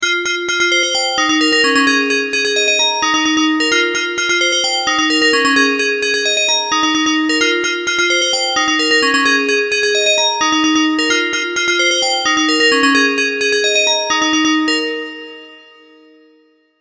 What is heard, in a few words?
blue 80s Bell